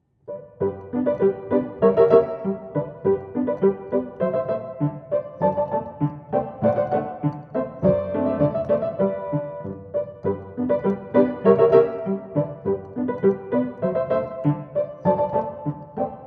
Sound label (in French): Polka; Polka du Mikado 1889